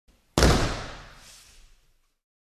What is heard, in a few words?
Judo throw on dojo flooring